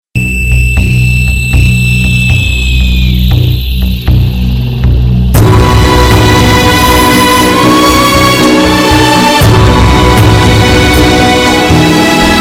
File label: Death Sound